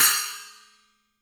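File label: Cymbal, Real Drum Kit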